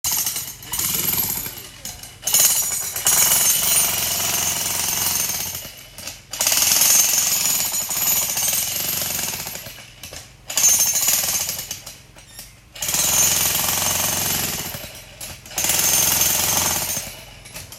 Drilling

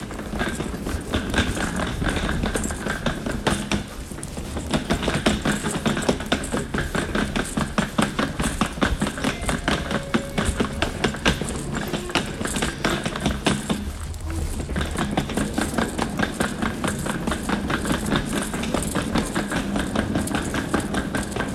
Wheels

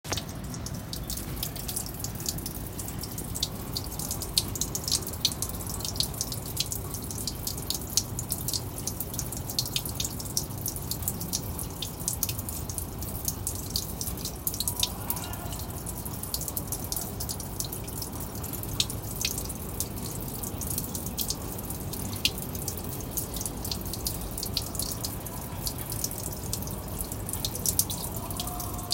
Running water

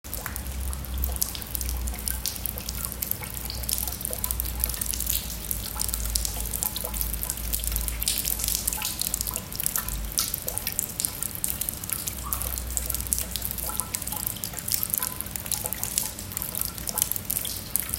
Temple rain

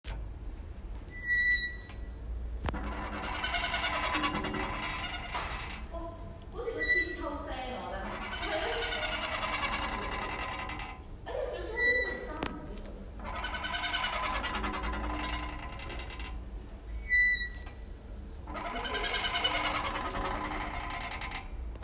Dolphin door

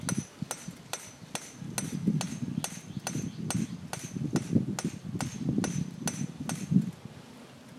Dóng dồ Hammering

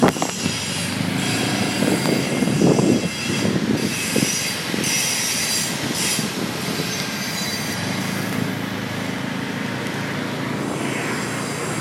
Tiệm sắt Blacksmith

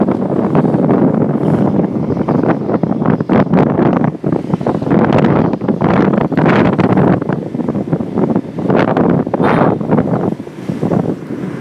Gió Wind

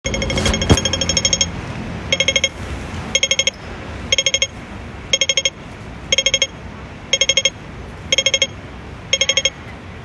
Traffic lights

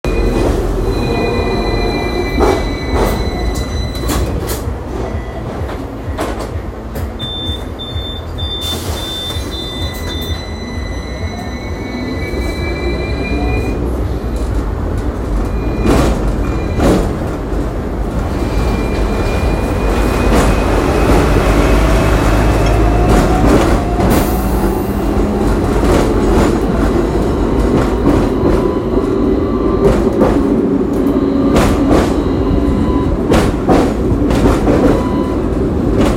Tramway

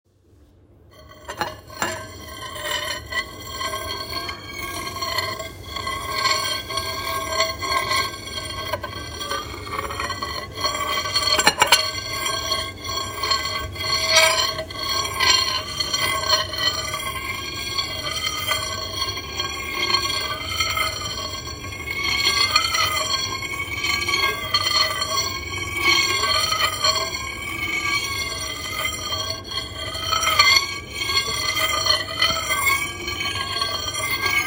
Spoon on plate

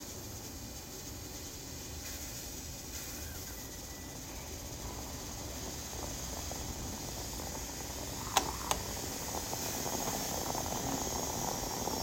Italian Moka

